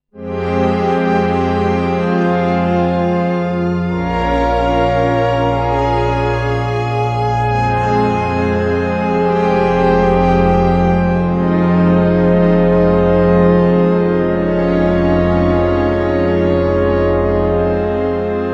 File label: pads analog; Prophet-VS; pads analogiques; arturia origin; sans aucun effet